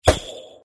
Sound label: explosion; Reindeer